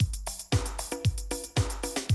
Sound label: Classic Drum Loops